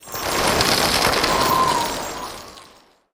Spell Frostbound

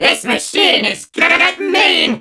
hurt vo

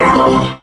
robo hurt

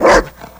dog bark mean

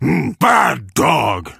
die

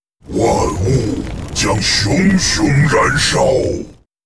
warcry

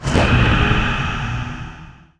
Destroyer Roars; 死亡时; 魔兽争霸3; 亡灵; 毁灭者的怒吼

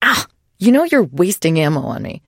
trader pain respond; 商人杰恩（七日杀）

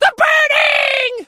crow hurt

